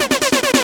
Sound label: Glitch